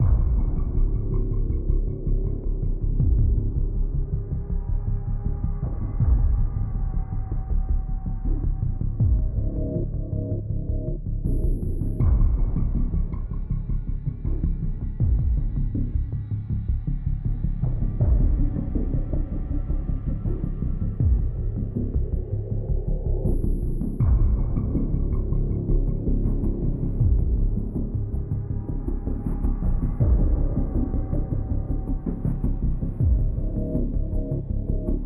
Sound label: Explore Suspense